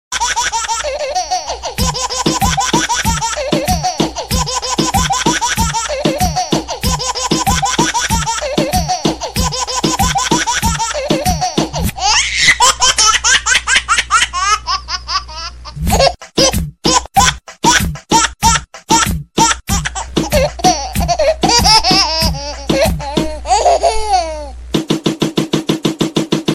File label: giggling ringtone